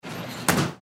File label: Freezer Door Close, Freezer Door Slide Close Restaurant; Appliance; Industry; Appliances; Sound Effects:Household; Blastwave